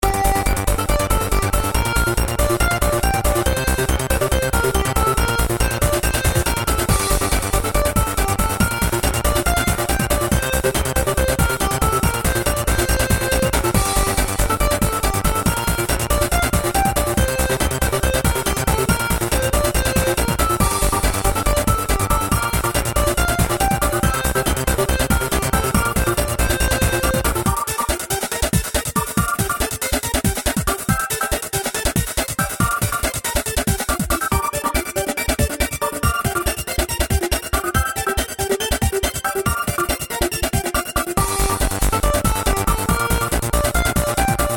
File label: game music